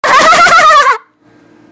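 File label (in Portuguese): foca risada, seal laughing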